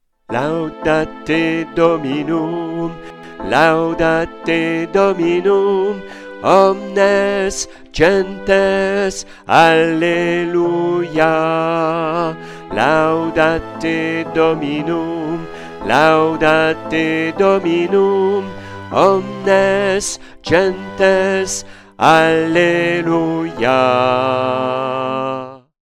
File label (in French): Alto; Laudate Dominum